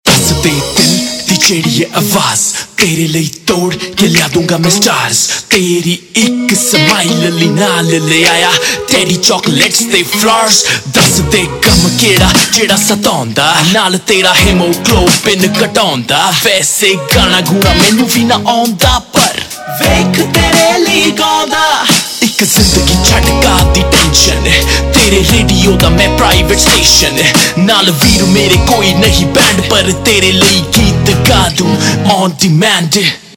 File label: Rap Ringtone, Punjabi Pop